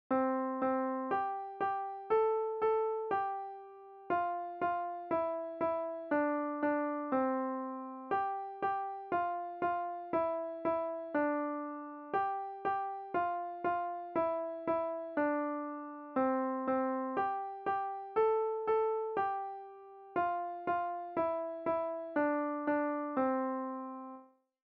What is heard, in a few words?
Twinkle rh; Chapter 10 interactive; melody notes